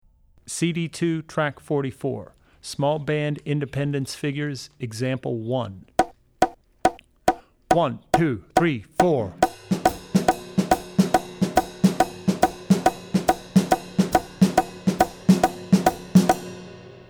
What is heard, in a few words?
Jazz - Small Band; CD2 Track44 Jazz - Small Band (Example 1); Drummer's Bible Tracks